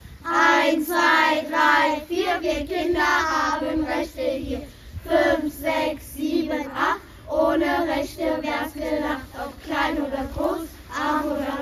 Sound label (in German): Kinderrechte Rap schnell